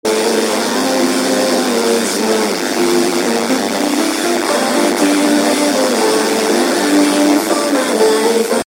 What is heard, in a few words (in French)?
FM brouillee soleil; Panneaux Solaires; Chaumes En Retz; Brouillages